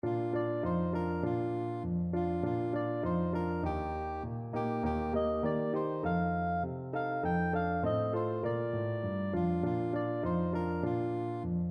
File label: jingle bells, soprano saxophone